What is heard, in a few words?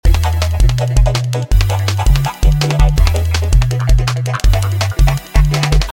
dog pound techno; pbskids; go studio